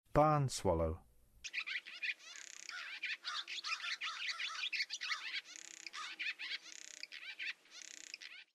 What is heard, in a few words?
Barn Swallow; North America